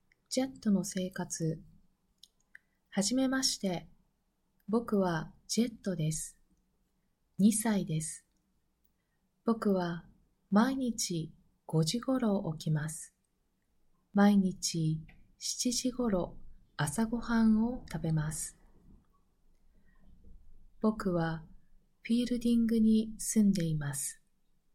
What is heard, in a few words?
Jet